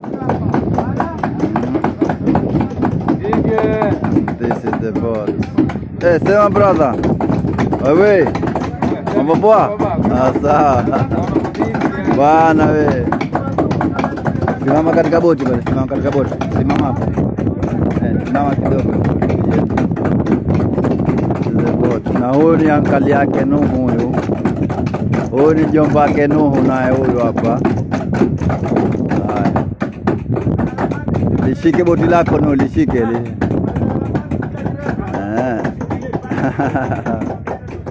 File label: communal caulking; mahazi project